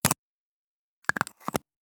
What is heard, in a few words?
handwriting fountain pen